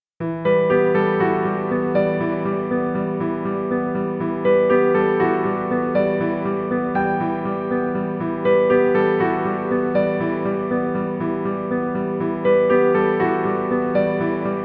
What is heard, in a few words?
Romantic Inspirational Piano Piano music